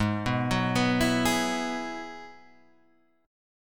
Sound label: G sharp Minor 7th Sharp 5th; G#m7#5 Chord {4 2 4 4 5 4} arpeggiated